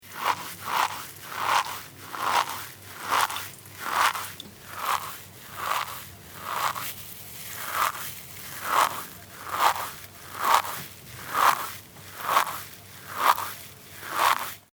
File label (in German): Metallschwamm kneten